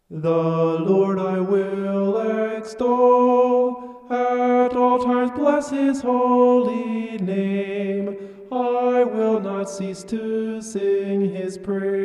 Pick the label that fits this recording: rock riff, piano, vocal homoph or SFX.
vocal homoph